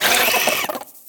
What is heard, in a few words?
Insects alerted; ice age scrats nutty adventure